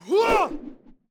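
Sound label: infantry javelin, weapon; Battle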